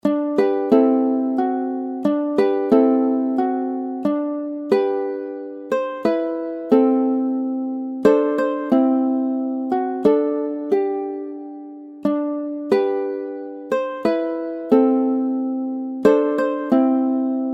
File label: ukulele